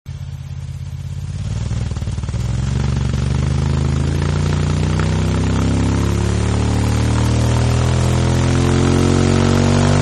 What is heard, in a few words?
Bike Going Fast, Motorcycles; Vehicles; Bike Going Fast2